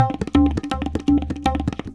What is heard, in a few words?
tabla 120